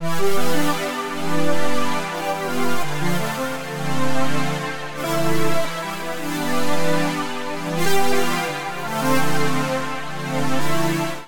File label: Pad C Major 85 bpm; Accompaniment; Tonal; MIDI Clips; Nostalgia Explorer Pad; Drive and Glow; Ableton; Factory Packs